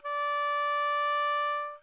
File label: oboe mf D5